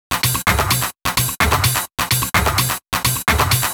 Minimal Loops

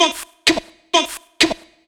House Loops, Vocal Loops House 128BPM

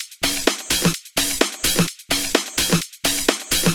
House Loop, 128 BPM; Vengeance Samples

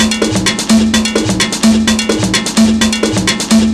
Percussive Loops 128BPM; Vengeance Samples

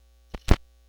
Record Noise, Vinyl Record; Record Noises

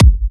Bassdrums

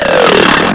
Acid Sounds G#, Synths; Vengeance Samples

